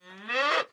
Calf Idle

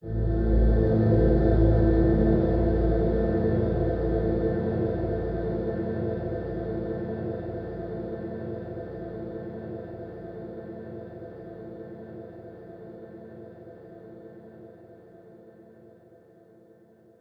stinger; Joe's Diner

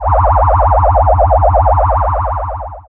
Magnet; OvenBreak 2

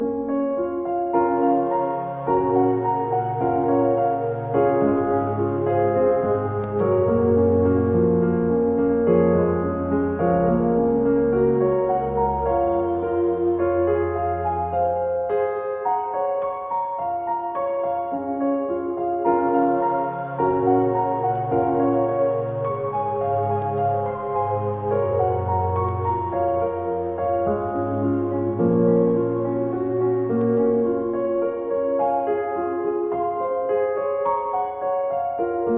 Song Loop

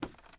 wood step; teardown; ps5 windows xbox series xs

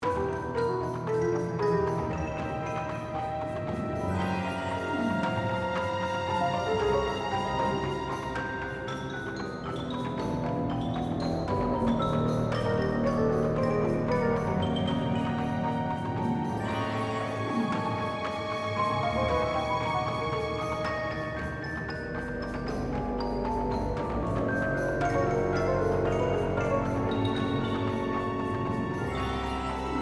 Theatre LOOP; From the Shadows

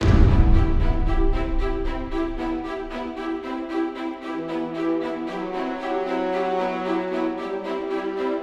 Starter Intro

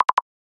Shake

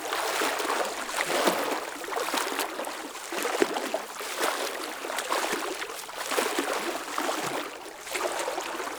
water float loop; teardown; ps5 windows xbox series xs

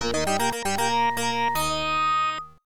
Game Set; blades of steel

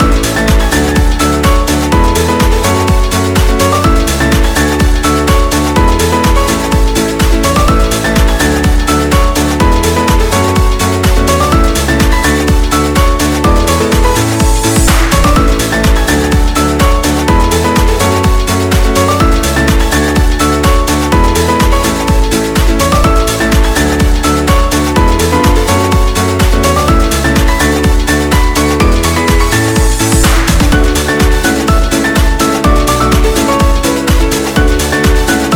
Music Loop; Mikrogame Rotator; Wii U

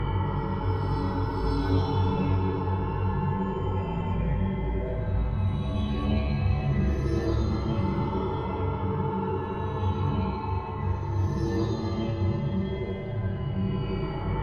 Duel Entry Loop; Yu-Gi-Oh! Master Duel

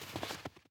snow step

